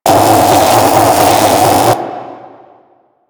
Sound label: Antimatter Drop Loop; Warframe; Warframes